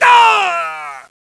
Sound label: pl death, player; sven; bts rc